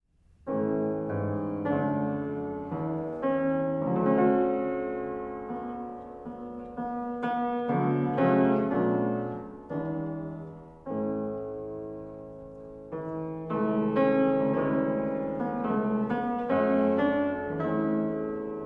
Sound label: sonata no. 3 in f major adagio